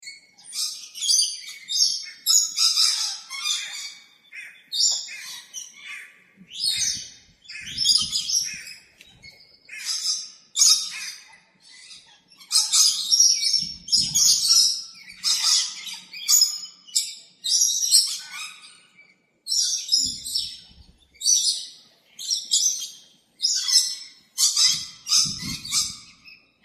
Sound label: Rainbow Lorikeet